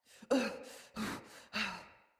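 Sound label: Cry Heavy Run Female; Battle Barks; Envoy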